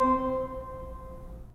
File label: 3D Pipe Mix Celeste, 3D Organs; E-MU Producer Series Vol. 5 – 3-D Audio Collection